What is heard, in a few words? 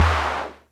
Percussion Computer Tom; Atlantex MPC-1